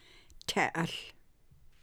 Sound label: harpoon style fishing